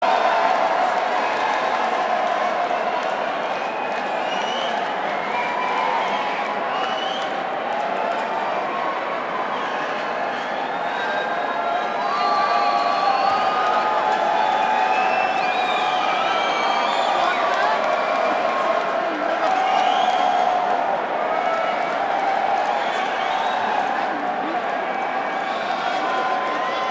Banter Crowd

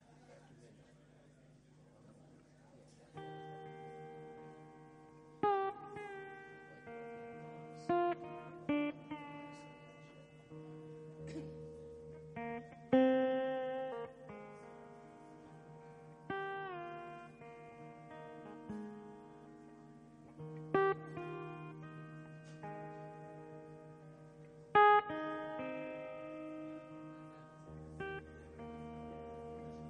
Tuning

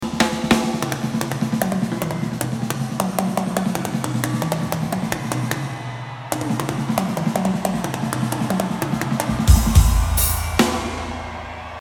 Drum Solo

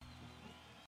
Bass Doodle

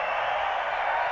Bass Solo

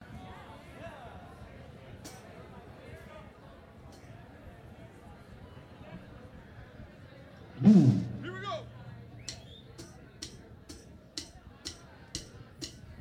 Crowd Hello

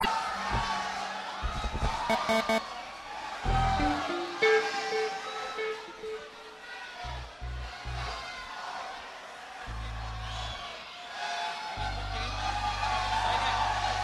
Tuning

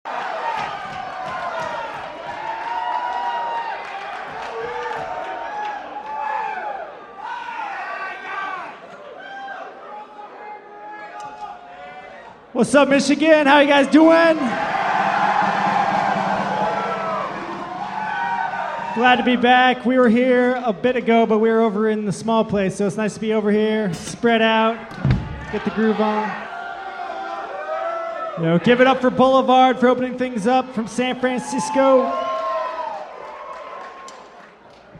Crowd Banter

